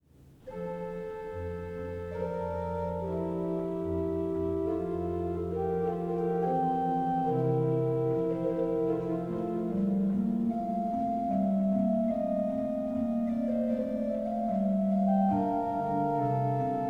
Trio Sonata No. 3 in D minor - II. Adagio e dolce, Great Organ; BWV527; Bach; Bach Great Organ Works; Johann Sebastian Bach